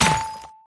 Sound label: shatter; astro Legends Lyra and Erion Desktop; Foxium